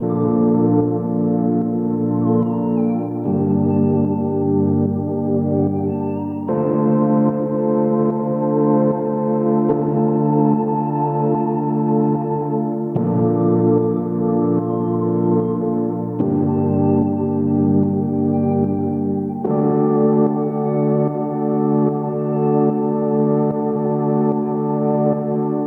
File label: Lofi Synths; WA Glow